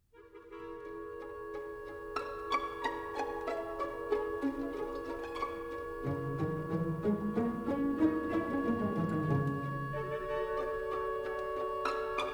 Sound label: Symphony In D Minor Scherzo Bewegt Lebhaft